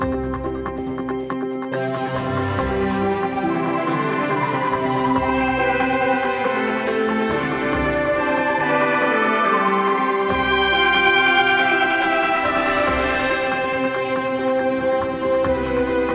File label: Player Victory